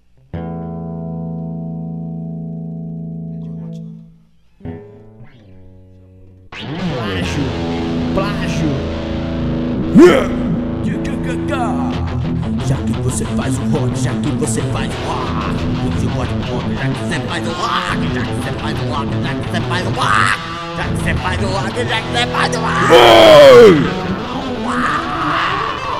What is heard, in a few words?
vinheta rock